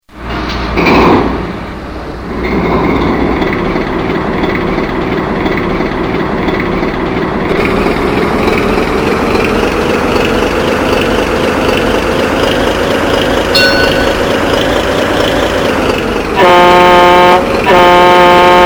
Schiff Schlepper; Soundbeispiele

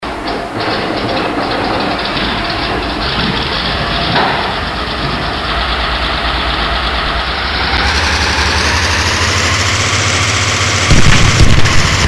Panzer Tiger, modellbau; Soundbeispiele

